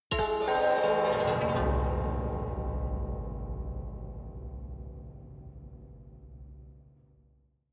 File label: haunted strings down stroke fast soft